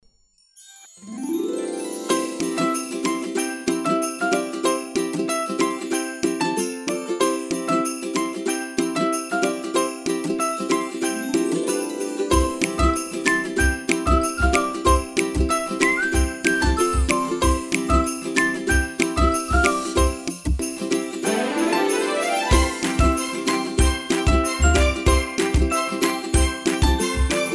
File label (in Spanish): navidad instrumental